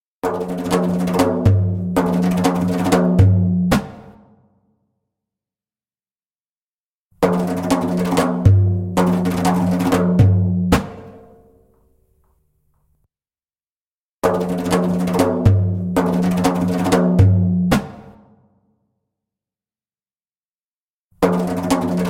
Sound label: Fill (slow), Djembolale; Djembolale - Fills and Drills